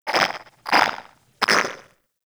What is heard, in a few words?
Garden Animal; Warframe; Clan Dojo; Dojo Garden Animals; Ambience